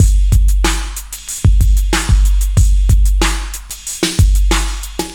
Drum loops, Electro

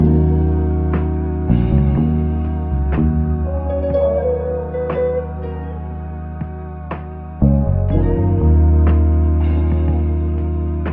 guitar, Loops

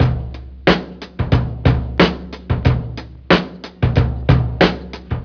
Drum loops Boombap

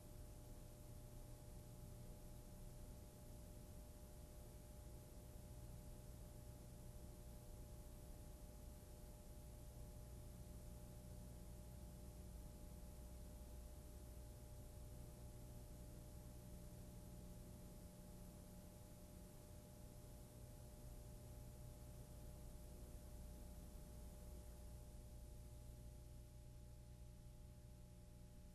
line noise, Vinyl

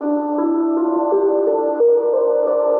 strings, Sad Loops

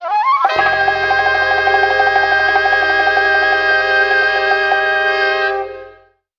long flute sting with congo

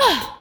huh female; ooh ahh; heh huh

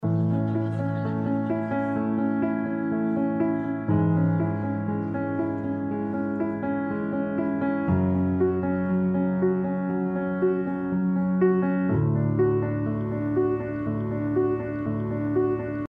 piano, Loops, Sad

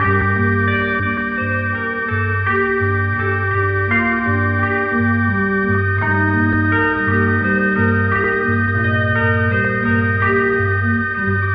keys and guitar; Loops

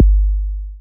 cholo, Kicks Heartbeats

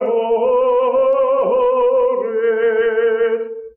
voice ho oh ho yeah, Loops, Sad

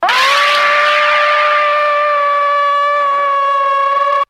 scream long

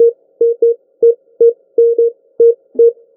one note beeps; Loops; Electronic

